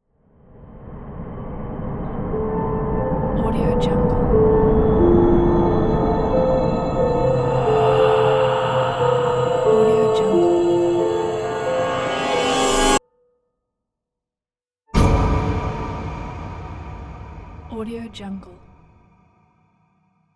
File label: halloween ident